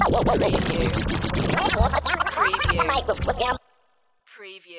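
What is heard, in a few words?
Scratch Crazy 100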